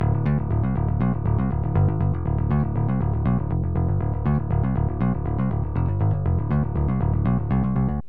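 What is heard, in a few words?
wet bass, Hybrid neural guitar overdrive; Morphdrive; Demos for hybrid configurations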